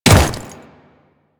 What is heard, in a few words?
Ice Step, Footsteps; Warframe; Grineer Royal Guard